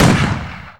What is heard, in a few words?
pp7 fire; weapon; npc